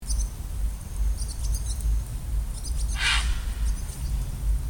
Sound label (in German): graureiher und rauchschwalbe; Graureiher mit Rauchschwalbe